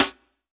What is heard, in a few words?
hiphop snare, Effect on